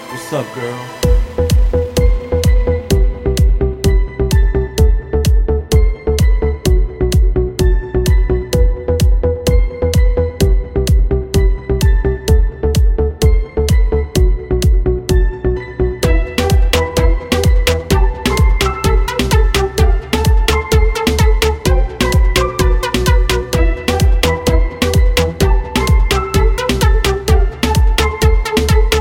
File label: Trance